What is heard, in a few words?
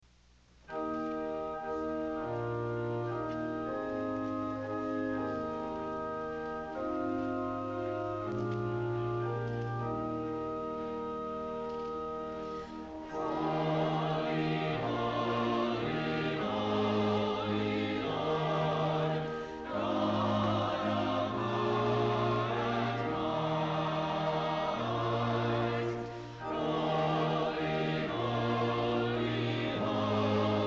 Sanctus, quartet